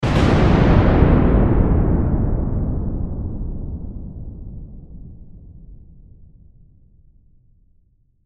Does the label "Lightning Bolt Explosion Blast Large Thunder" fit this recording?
Yes